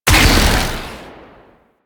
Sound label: Modular Secondary Barrel Fire